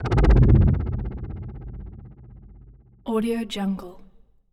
pewhoosh

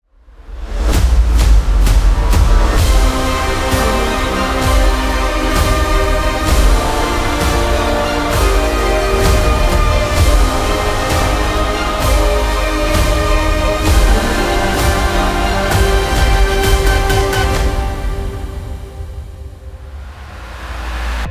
epic trailer

